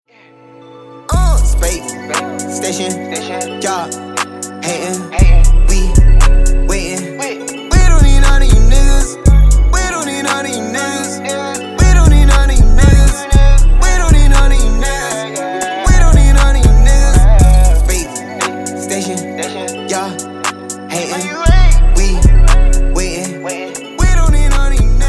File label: HIP HOP